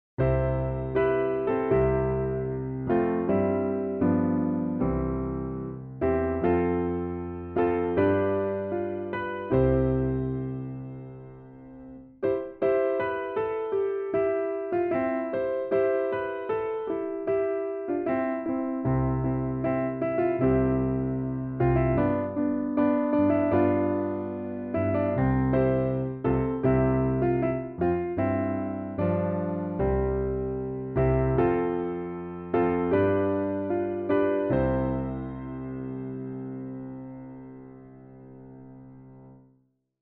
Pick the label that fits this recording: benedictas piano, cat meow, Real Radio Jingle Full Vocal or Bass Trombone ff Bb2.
benedictas piano